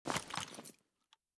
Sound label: ak74 holster, weapons; Mask's Reanimated Anomaly Arsenal